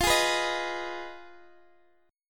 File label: F Minor 7th Flat 5th, Guitar, Open G; x,x,15,13,12,13; down; chord shape